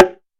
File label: Bongo Perc; Cymatics; PHARAOH Beta Pack; Pharaoh Premium Drum Samples (BETA); Percussion